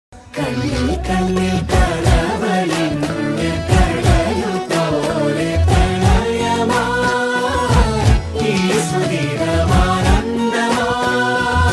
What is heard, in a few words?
song ringtone, malayalam ringtone bgm; sita ramam movie